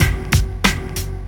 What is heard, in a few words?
Old School Break, Drums